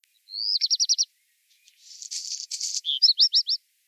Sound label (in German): Hausrotschwanz kurz